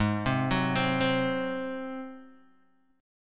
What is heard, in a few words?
G sharp 7th G sharp, Guitar DADGAD